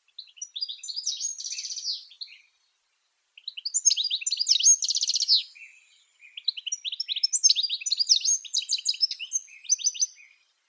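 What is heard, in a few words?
goldfinch, Carduelis carduelis; zvuki prirodi; shegol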